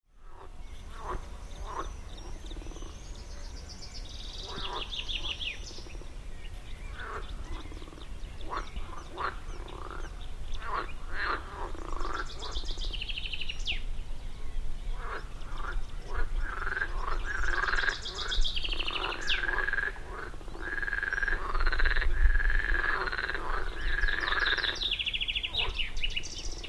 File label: Froschkonzert frogs concert